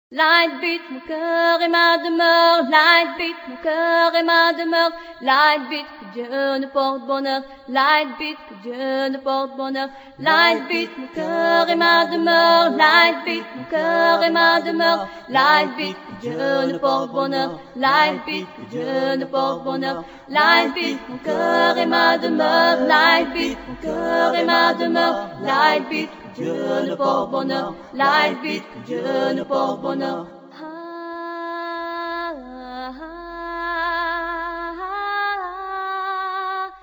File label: Light Beat Intro; hiphop